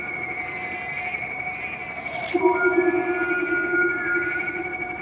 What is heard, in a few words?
signal; The Thing prequel